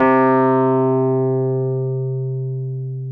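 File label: Wurlitzer electric piano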